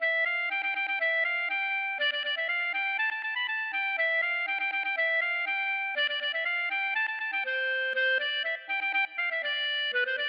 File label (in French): aeroplane; Manceau